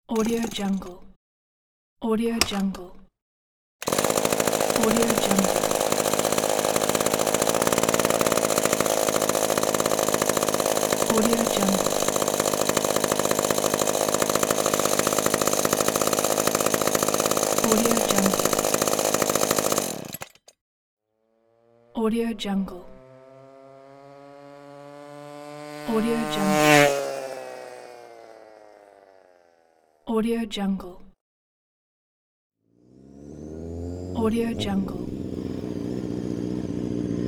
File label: scooter